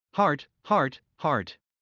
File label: Heart mid; Classroom humanbody